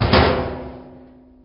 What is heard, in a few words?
shock; openspace3d; FPSDemo